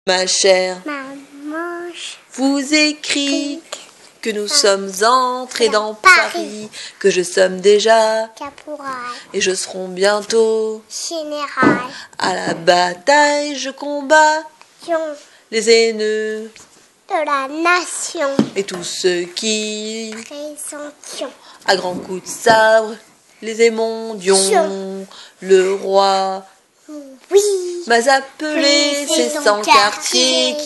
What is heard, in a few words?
Vocal